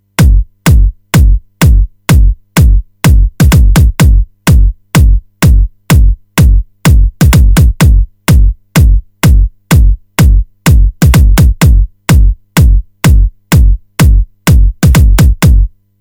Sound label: Kick; ZEN